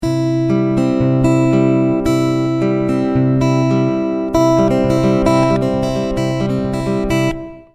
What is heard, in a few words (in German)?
zupf